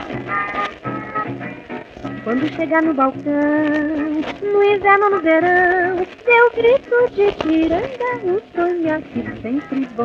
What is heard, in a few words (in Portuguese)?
jingle abertura; exporvisoes